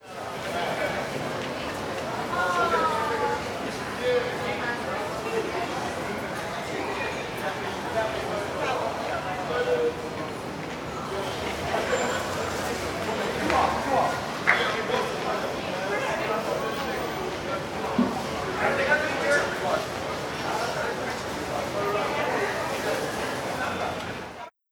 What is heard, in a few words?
Vatican city Walla